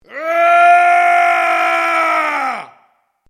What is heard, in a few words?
MALE ROAR AGGRESSIVE LONG; Yelling; Voice